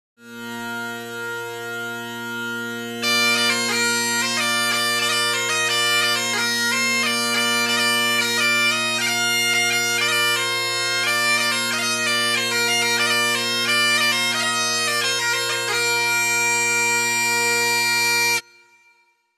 Cornemuse

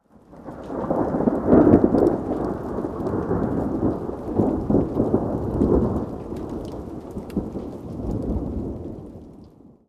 L'ORAGE

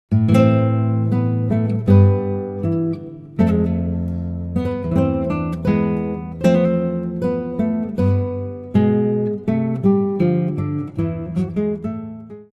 guitare

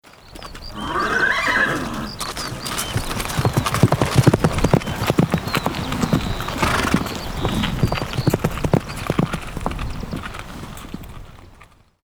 CHEVAL

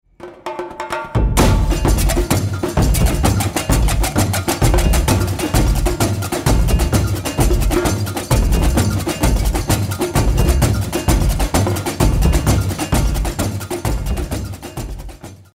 Batucada musique bresilienne

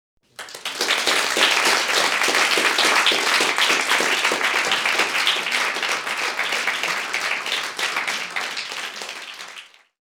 APPLAUDISSEMENTS

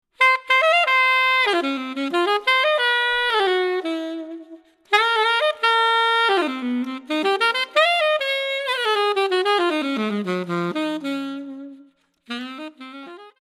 saxophone alto

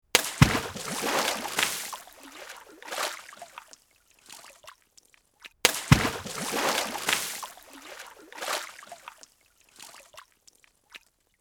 GROS PLOUF

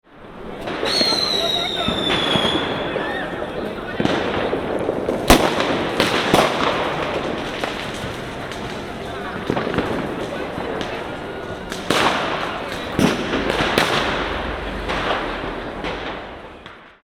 FEUX DARTIFICE

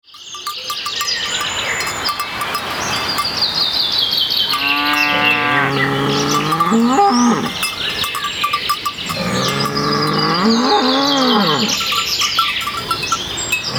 VACHES ALPAGES

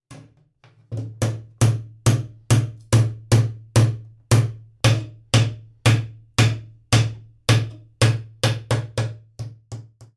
LE MARTEAU

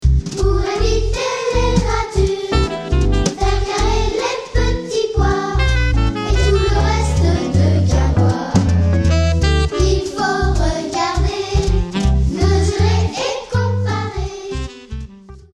CHOEUR ENFANTS

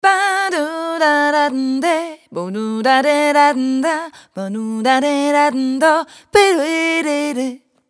voix chanteuse

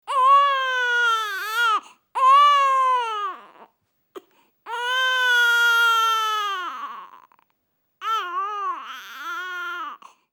CRIS DE BEBE

